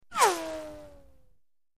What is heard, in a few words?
Left To Right Tonal Bu, bullet bys